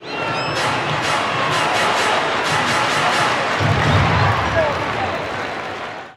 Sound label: Baseball Organ Crowd Claps; Crowds